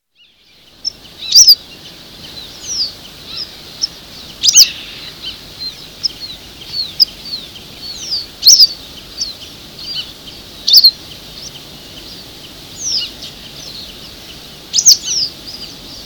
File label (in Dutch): gele kwikstaart